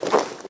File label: armor light; smite; chiron